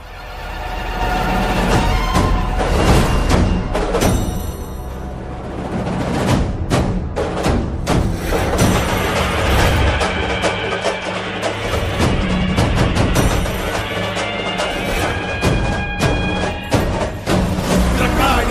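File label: background music Ringtone; Kanchana 3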